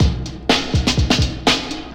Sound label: sweet pea, breaks